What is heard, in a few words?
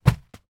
household 4 disposable nappies drop on carpeted floor